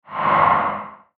Whoosh Breath Airy Ghostly; Blastwave; Whoosh Sound Effects

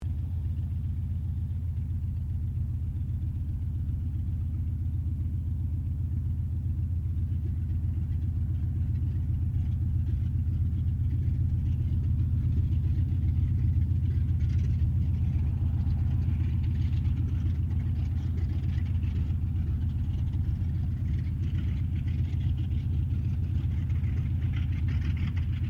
AIRCRAFT CERES WINJEEL IDLE DISTANT; Mixed aircraft squadrons; Airplanes; Vehicles

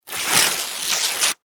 Office Paper Tear Slow Rip; Materials

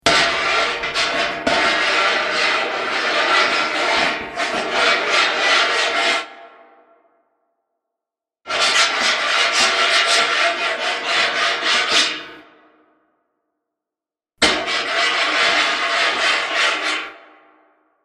Scraping Metal, Metal scraping and sliding sound.; Metal (Sound Effects:Materials)

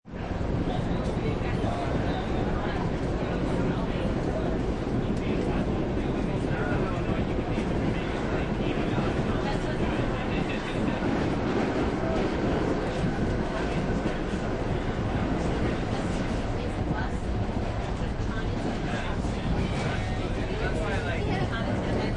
Train Interior

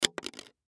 Credit Card Drop On Counter Top; Drops; Objects; Blastwave; Foley

